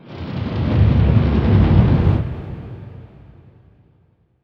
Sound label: NOISE, Production Effects